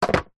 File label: industrial leaf blower electric small set down ground